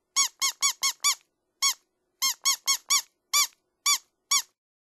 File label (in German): quiekser 1stimmig